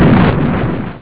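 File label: explosion; Boum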